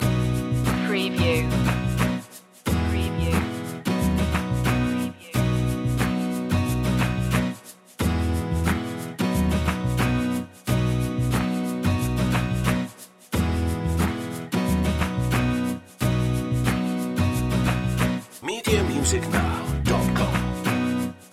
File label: happy clappin loop